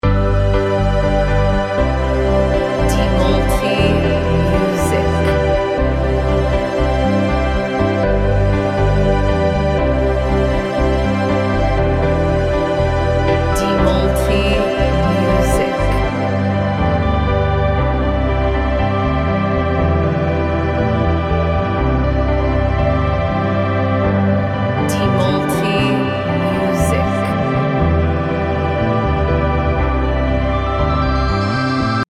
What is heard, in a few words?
instrumental modern piano; Ada pola yang tidak berteriak, hanya berbisik. Lagu ini ibarat mendengar kode rahasia yang diulang terus, pelan tapi konsis